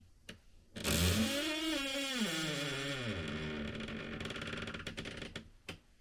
Sqeaky Door Hinge

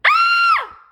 Woman Scream

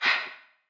Soul Hit

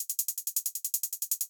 808 Side Chained Hats 160pm

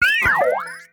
Robot Concerned Balanced

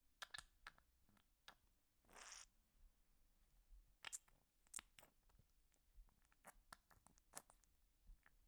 Squishy And Gore

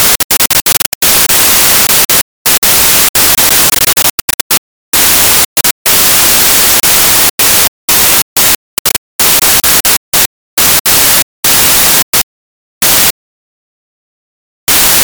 Bad Radio Noise Scattered

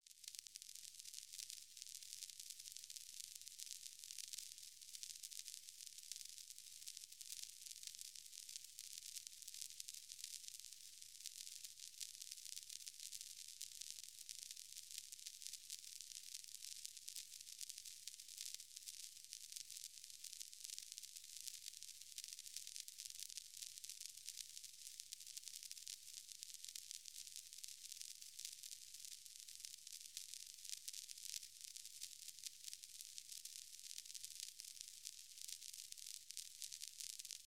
Phono Thru Gran

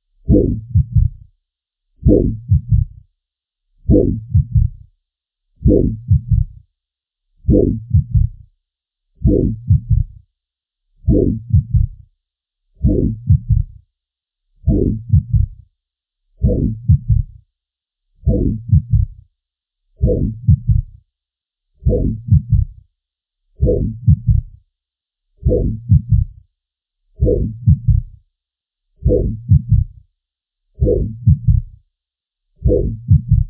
Artificial Heart Spatial

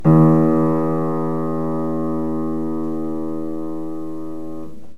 Piano

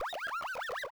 Squeakish Sound

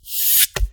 A Dart Being Thrown

